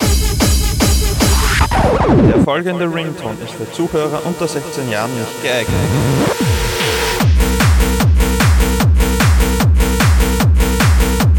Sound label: ringtone version hardstyle mix